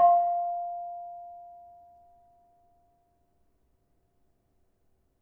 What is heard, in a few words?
Bonang Barung, gamelan